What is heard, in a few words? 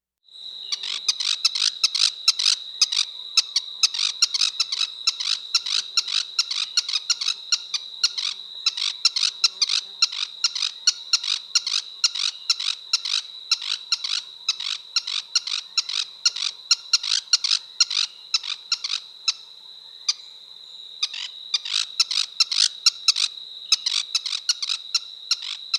Song and call, Birds of the Costa Rican Cloud Forest